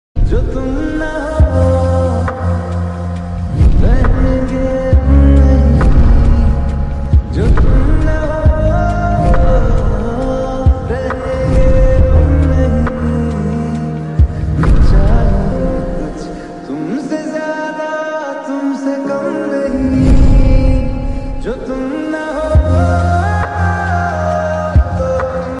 lofi remix ringtone

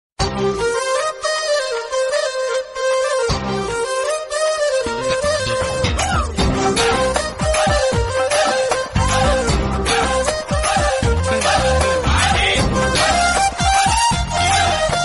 ringtone, bgm ringtone; pudhupettai

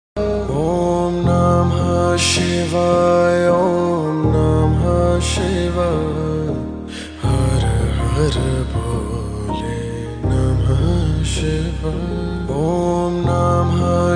om namah shivaya mahadev ringtone

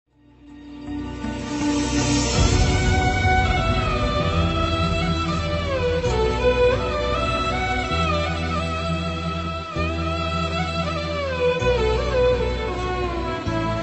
emotional bgm best emotional sad bgm; attarintiki daredi; pawan kalyan bgm

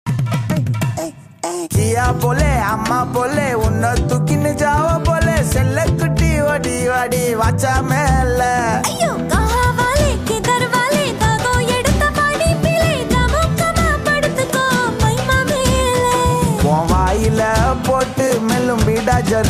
tamil love; Raayan

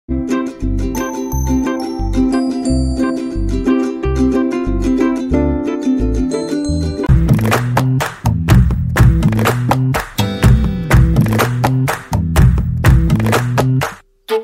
instrumental bgm ringtone; thiruchitrambalam

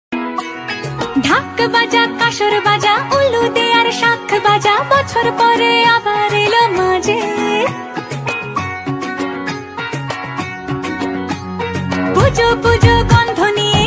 dhak baja kashor baja durga puja ringtone; hindi status ringtone

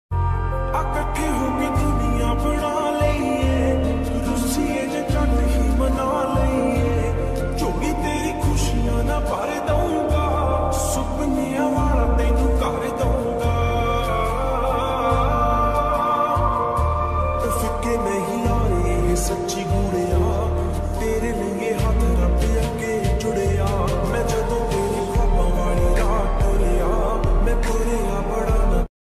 slowed reverbe ringtone lofi mix ringtone

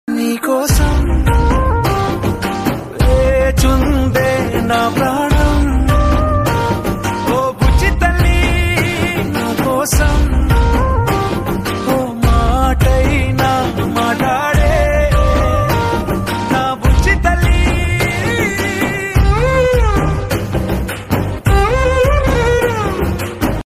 Telugu love song ringtone; thandel

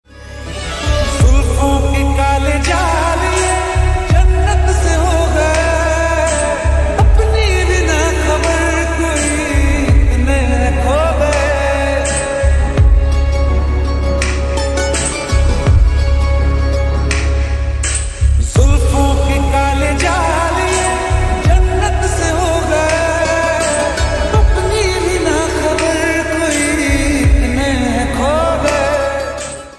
lofi mix ringtone

